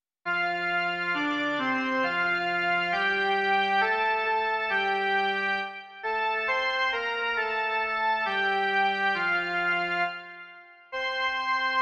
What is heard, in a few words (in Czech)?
jen melodie; Ó město Boží, ty jsi štít